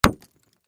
Impact Rock Hit; Impact Rock Hit Debris; Rocks; Materials

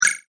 multimedia rollover, Multimedia Rollover Tone; Tones; Sound Design; McKinney Sound

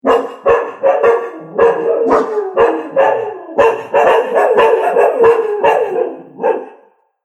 Pack Of Dogs; Dog Sound Effects; animal sound effects

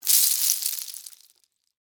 Debris Penne Rigate; Texture; BlastWave

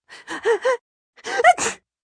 Sneeze, female, cold, sick, (human voice); Dainty; Sneezing Sound Effects

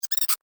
Quick Scratchy Bleep Sequence; Beep Sound Effects; electronic sound effect

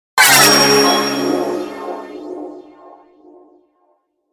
Warble Swell Shimmer Reverb; Production Element Imaging Element Accent Transition; Blastwave

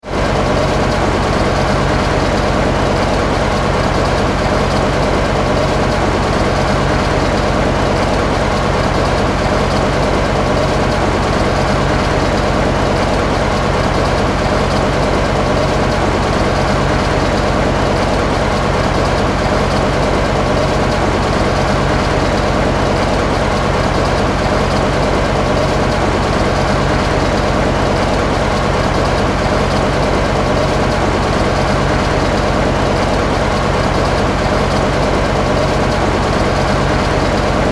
Generator; Motor powered electric generator.; Motors

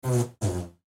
Horse Fart; Horses; Animal

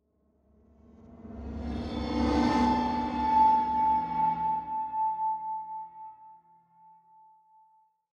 Sweep Feedback Rear To Front To Rear; Production Element Imaging Element Accent Transition; Sweeper; 5.1 STEREO MIX; Blastwave

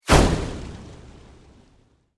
Sweep Mechanical Fast

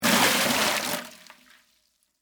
Water Pour Bucket, Into, Metal, Large; Pouring Sound Effects; BlastWave

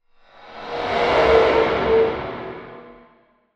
Scary Metal Transition; Scary Soundscapes; Horror; Crafted Signals

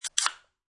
Soda Can Pop, Popping a soda can open; Drink Mixing; SFXsource

